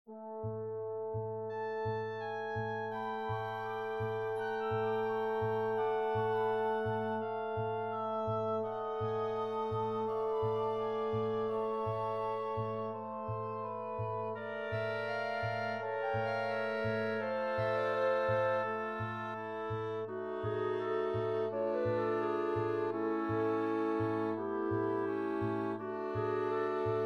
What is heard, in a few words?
midi